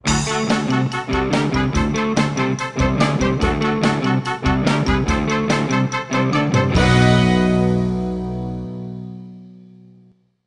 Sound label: Bari Sax